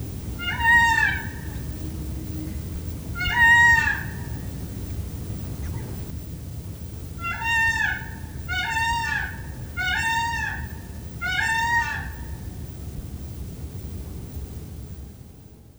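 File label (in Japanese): kujaku, インドクジャク 鳴き声; 夕方、トランペットのような大きな鳴き声をたてながら、木をわたって飛び移り、樹上のねぐらにもどります。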